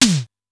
SDS2000 SN; SDS2000 Factory4

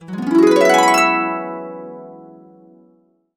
E, Harps

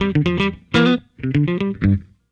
Guitar, 70s Analog Funk

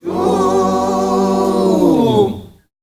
TOOOO END, Heart of Africa, CHOIRS; Spectrasonics

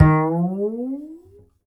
Upright Acoustic Bass; Larry Seyer; SWITCHABLE POSITIONS

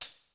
Tambourine, Percussion; Giga Samples Collection; ELQ-DrumQit; rock toms

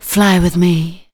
vox, Techno Trance; Ueberschall

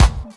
KIT, Dance Mega Drums; Best Service

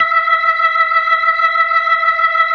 E5F, B3

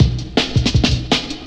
Beatle Cut, Jungle Drum Loops; Zero-G Jungle Warfare 1, 2 & 3

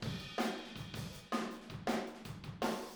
Room, Drums, 162 BPM; Verse; Room ST

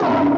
lion, Casio SK5

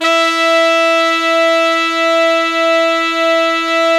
TRP TEN SECT, Pop & Funk Brass; Best Service ProSamples vol.25; AKAI

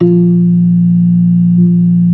B3 A#2; Best Service Hyper Dance

